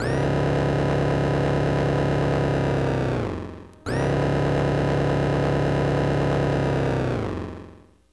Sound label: keys vibrato pitch